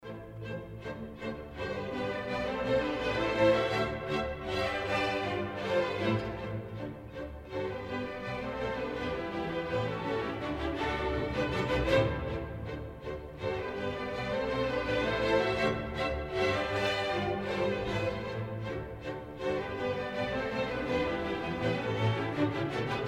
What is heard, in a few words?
Wedding Dance